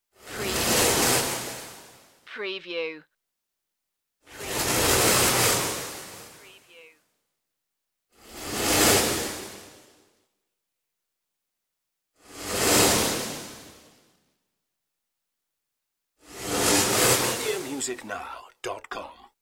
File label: light space whooshes